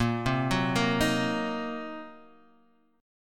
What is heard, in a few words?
Eb Minor 6th Bb, guitar chord